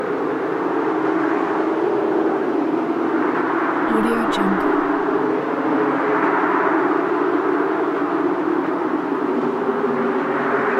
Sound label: wind loop